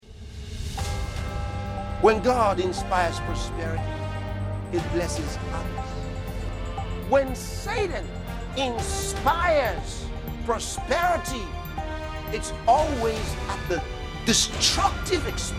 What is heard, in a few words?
JINGLE